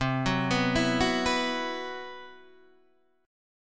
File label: C Major 7th Flat 5th C, Guitar Open D